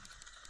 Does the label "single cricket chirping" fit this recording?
No